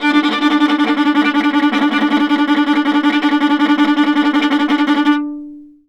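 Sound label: viola tremolo D3; Orchestral; DirectWave; Varazdin Orchestral; Viola II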